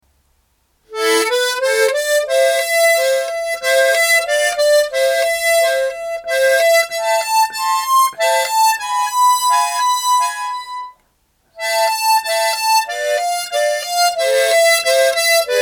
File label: harmonic minor; akai